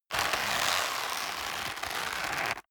leather creak hard

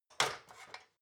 ladder metal handling lock

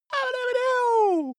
cartoon male cheer

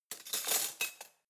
cutlery spoons drawer place soft

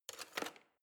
knife wood drawer handle slide

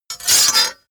sword cut hard scrape

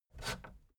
fader plastic scrape single up halfway fast

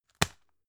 tissue package drop hard

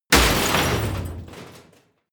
drop metal crush

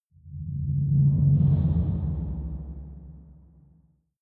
air metal rumble

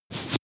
air pressure release

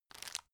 tissue grab soft

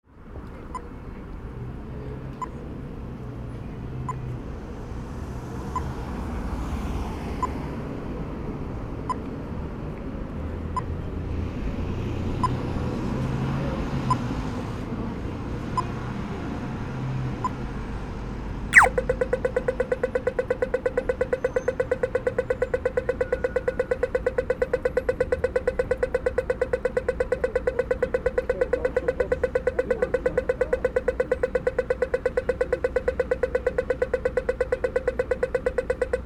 traffic light signal noise

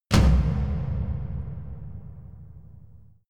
percussive stomp

